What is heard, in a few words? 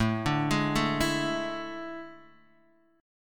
A Major 7th Suspended 4th, Guitar, DADGAD